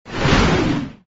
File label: launch whoosh